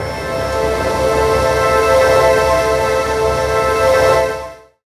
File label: Ambi Pad; Zero-G Jungle Warfare 1, 2 & 3; 90 s Sample CDs